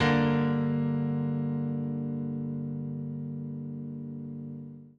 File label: PIANO 96 G; OLDSCHOOL; Keys